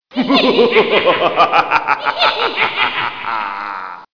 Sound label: dark evil laugh